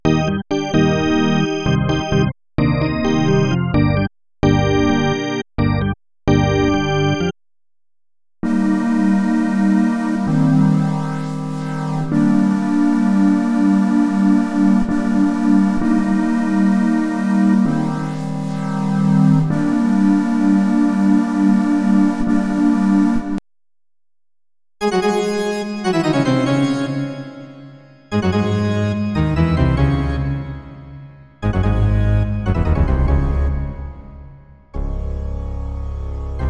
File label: Synclavier Organ